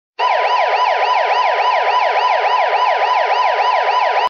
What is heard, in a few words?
police tone; jazz